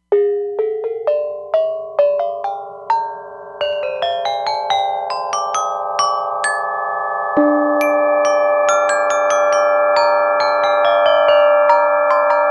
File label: Gamelan, E-mu Proteus-3; Factory patches